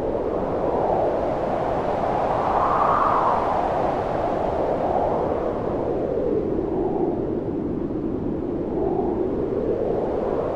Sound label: wind howl; Mindustry